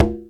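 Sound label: Conga 1 Palm, dry perc, Quasimidi Rave-o-lution 309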